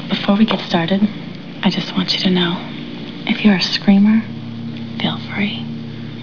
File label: screamer; Faith: Before we get started, I just want you to know, if you're a screamer... feel free!